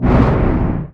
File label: torpedo hit; weapons; Stellaris